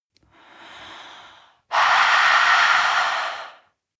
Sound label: breath female eerie hiss